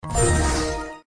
获得奖励

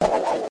冰冻手雷, 武器音效

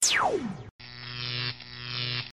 激光发射; 武器音效

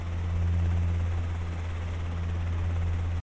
风扇

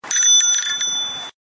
自行车骑走

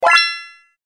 选中正确茬点

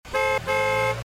车子滴滴声

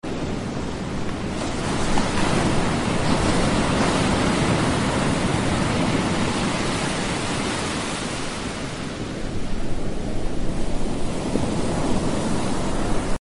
场景一背景音

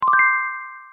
转盘选中音效

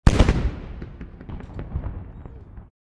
信号枪

